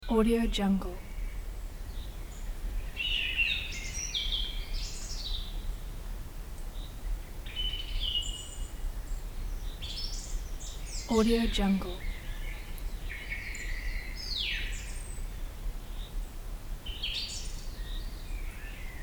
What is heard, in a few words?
forest evening chorus; غروب جنگل